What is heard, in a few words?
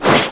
spike emerge; Ferazel's Wand Demo